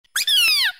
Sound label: woah; toad